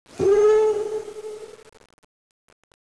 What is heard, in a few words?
bike whoop; atat